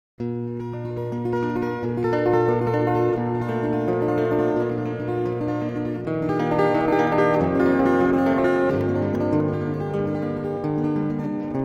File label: guitar